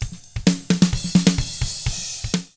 HRDRK, hard rock drums; ILIO; Double Platinum Drums 1

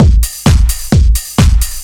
sharp stick

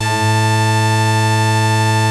OB A3 -L, Rare Synthesizer; Hollywood Edge - Giorgio Moroder Rare Synthesizer Collection; OB 4 VOX.

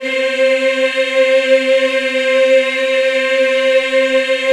C4, Choir Acoustic & Synth; USB Soundscan vol.28; AKAI

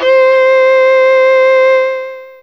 Stge Solo C4, Fairlight CMI IIe Lo String; 11LoString

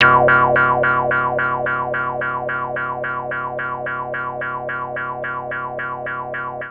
OB FX; Masterbits; Vintage Voltage; SEQ-FX

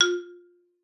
F3, xylophone; Xsample VOL-4

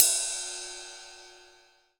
MIX1 RIDE, MONO RIDE

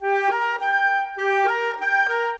Clarinet Flute; Sonic Foundry (Sony Creative Software); Orchestral VOL-2; Modern

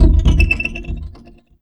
Perc; Sonic Foundry (Sony Creative Software); Esoterik Beatz; Mecan Kit

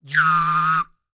WHISTL, World Voices, ANDES; Spectrasonics Vocal Planet

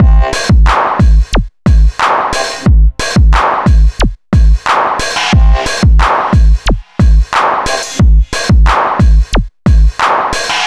mix grunge 090bpm; Zero-G; Downbeat Dance Grooves

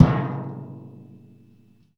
BIG TIN HI, PERCMETALIC; Rarefaction; Poke in The Ear With A Sharp Stick

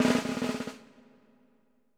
roll, snares; Sampleheads - New York City Drumworks VOL-1

